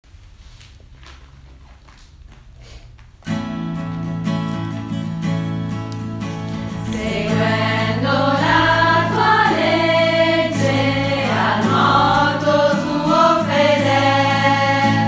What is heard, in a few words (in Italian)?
canto promessa